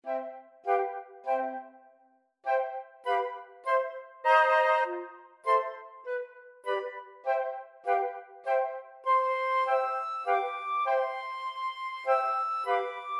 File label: flute choir